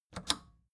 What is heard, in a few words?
record player power click off fast